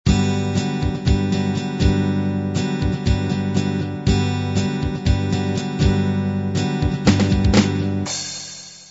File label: classic beat intro